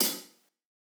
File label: Rock hihat; Reference Beat